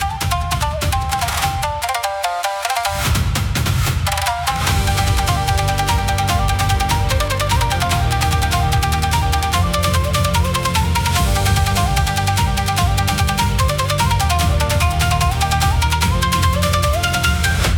Blade on the Bridge, cinematic japanese style battles